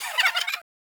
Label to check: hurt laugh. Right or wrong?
Right